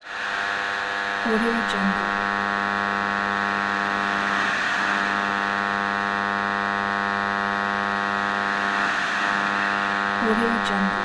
old radio noise

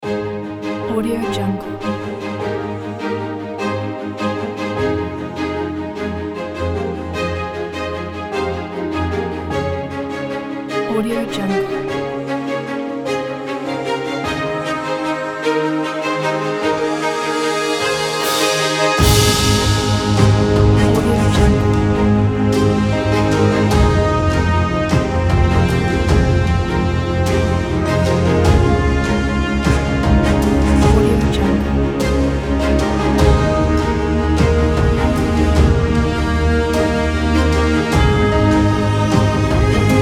award ceremony

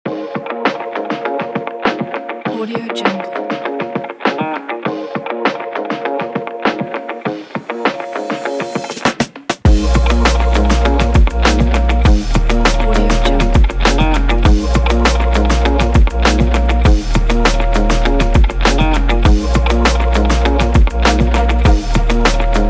that funk

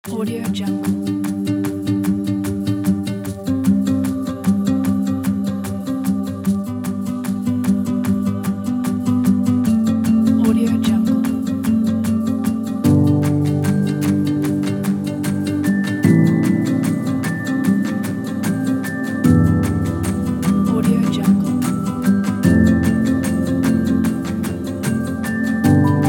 advertising folk

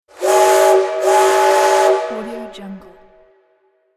steam train whistle

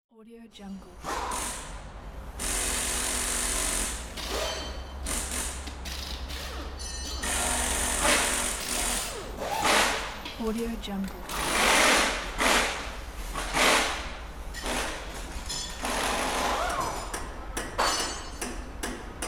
auto repair shop ambience; monomarket

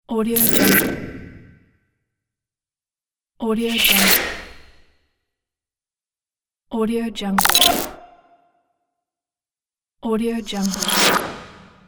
glitched whoosh

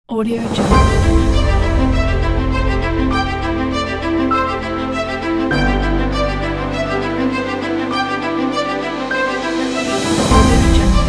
epic intro music

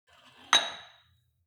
sport gym weight rack insert weight medium

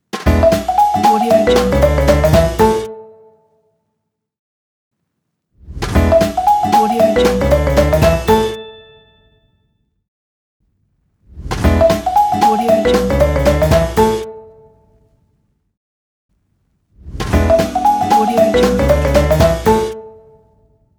short jazz marimba logo

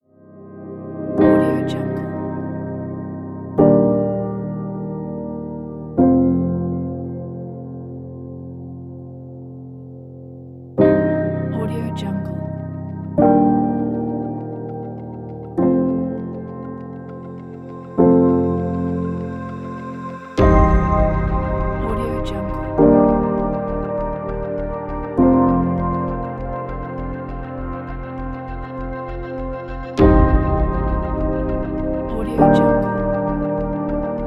landscape cinematic

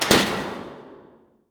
mechanism breaker light switch on small; soundmorph

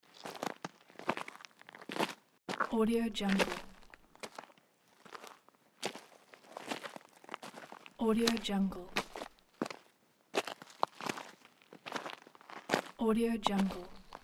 footsteps on rocky path

